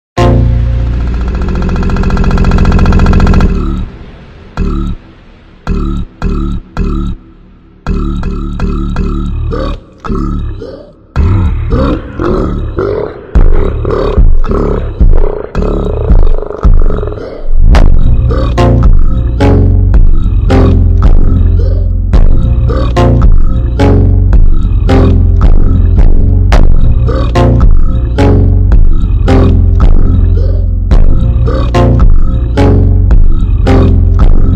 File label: ultra slowed